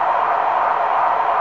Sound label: Fan Loop; Zelda; OOT